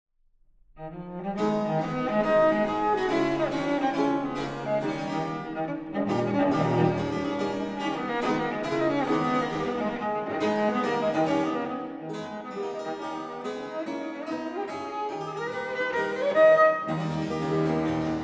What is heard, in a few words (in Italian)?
Sonate a Violoncelio Solo e Ba; Jakob Hermann Klein V. Sonate en la menor op. IV: I. Poco Allegro; Lindoro